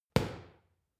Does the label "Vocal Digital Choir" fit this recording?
No